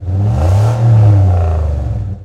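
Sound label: Truck Cherokee Rev; SFX Kit; Trucks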